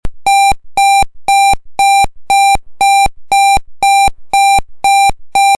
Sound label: tone